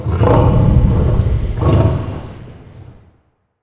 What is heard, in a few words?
templer angel idle; monster